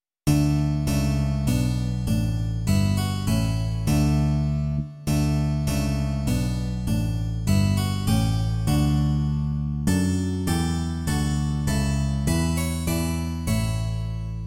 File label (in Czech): harpsichord, loutna a basová kytara; Pane, co ti nabídnout; Imaginativní verze